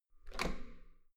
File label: metal door heavy handle up hard